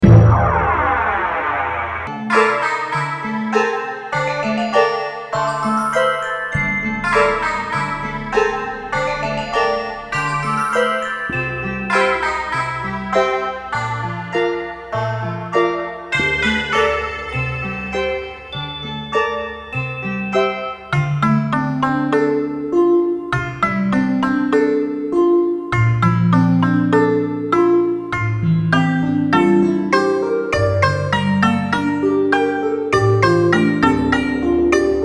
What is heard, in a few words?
fan dance; aladdin the cave